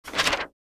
Magazine page single flip

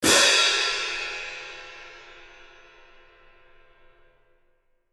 Italian cymbal crash long

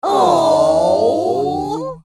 People cute aww crowd reaction

